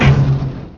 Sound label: torp land, weapon